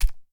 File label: flesh impact